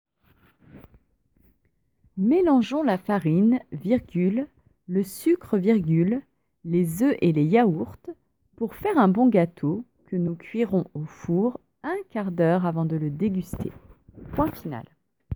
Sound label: Vocal